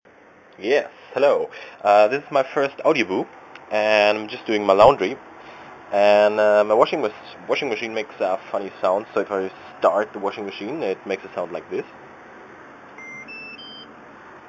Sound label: my washing machine